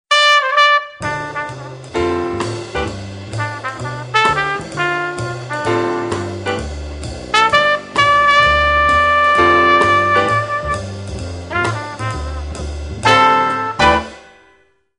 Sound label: Jazz; D&G